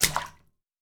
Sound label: Puddle